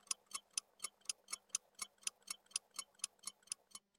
schtchik tikaet; poshli dengi

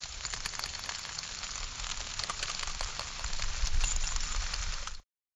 kapli barabanyat po listve

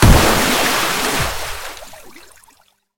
izverjenie nachinaetsya moschnyiy vyibros, zvuki geyzerov

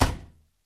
shvatili radost aplodisment igryi; zvuki bumeranga

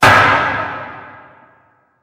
molotok odin udar ehom, zvuki suda

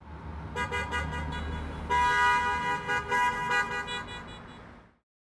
zolotistaya mashina manhettena signalyi, taksi

